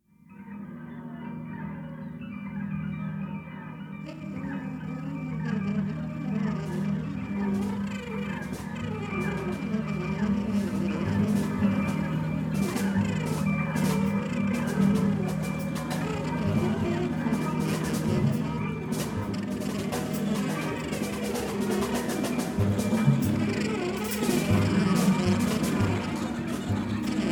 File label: saxophone